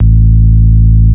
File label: sub A, techno trance bass